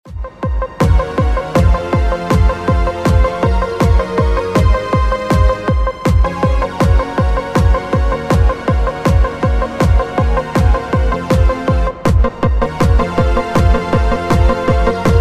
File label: Adagio for Strings